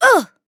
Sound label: Grunt Hit; Paladins; Seris